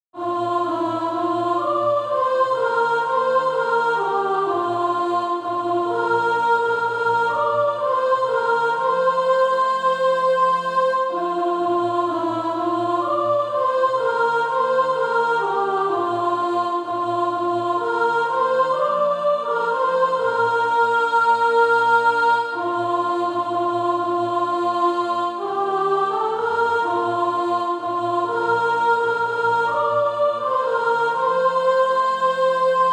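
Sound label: soprano, Practise Music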